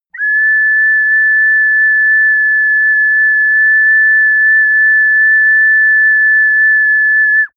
ocarina unstable high long

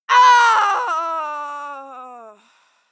female fight cry die

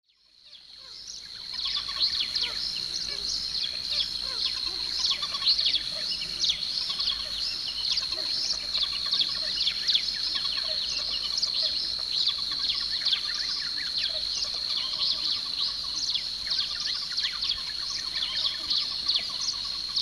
rain forest birds

